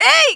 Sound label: kiai; MonsterHunter; Fight